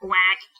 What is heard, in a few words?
quack